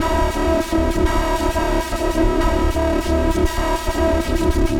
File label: Noise Beat; Drums & Drones